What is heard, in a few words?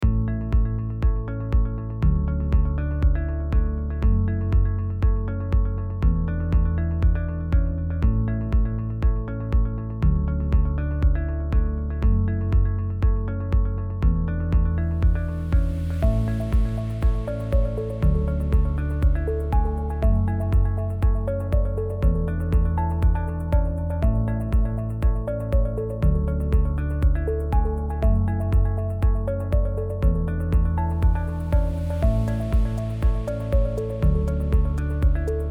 positive corporate videos